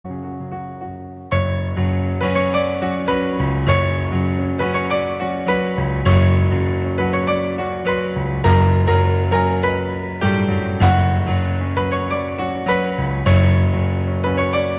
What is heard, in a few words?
piano cover; Indian